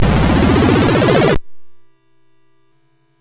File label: Dragonworld sid MOS6581R4